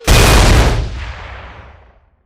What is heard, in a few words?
rocket explosion close; zoochosis helicopter